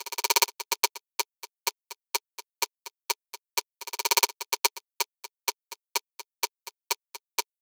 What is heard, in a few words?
Perc, 126, Cmin